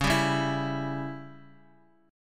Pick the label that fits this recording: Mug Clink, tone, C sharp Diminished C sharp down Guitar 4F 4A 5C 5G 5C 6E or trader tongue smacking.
C sharp Diminished C sharp down Guitar 4F 4A 5C 5G 5C 6E